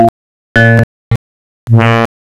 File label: ENSONIQ ORGAN EPIANO JAZZ GUITAR BRASS